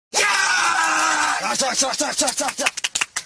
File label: Yaaaa cak cak cak cak